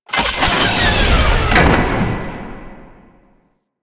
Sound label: Ship Metal Mech; Warframe; Corpus Ship Remaster